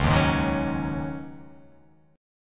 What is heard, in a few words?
C sharp Suspended 2nd Suspended 4th, C sharp